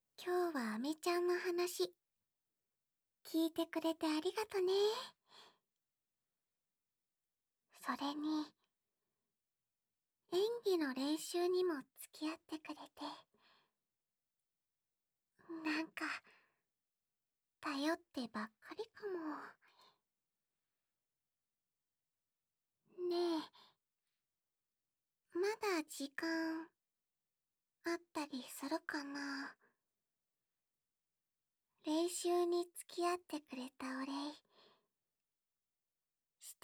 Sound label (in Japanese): 膝枕でひそひそ耳かき